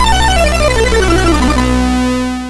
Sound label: sid MOS6581R3; hvsc